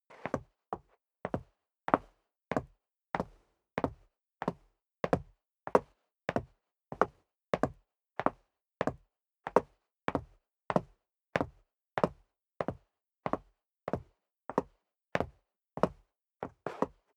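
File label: step dress boots concrete downstairs sequence, footsteps; schritte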